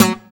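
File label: GUI PULL, acoustic guitar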